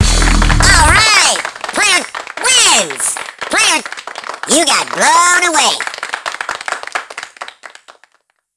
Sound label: Battle Mode End (You got blown away)